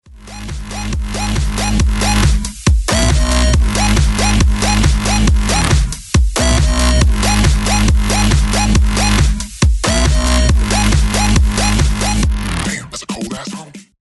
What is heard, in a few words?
funk rnb hits remixed; multitrax